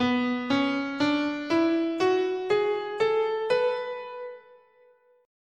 B Dorian

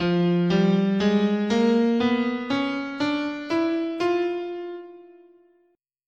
F Diminished Whole Half, scale

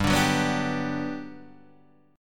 G Major 7th, G

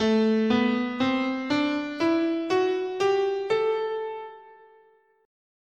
A Dorian, scale

